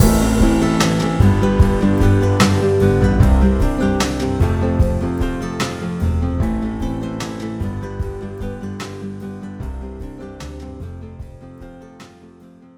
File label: Piano Main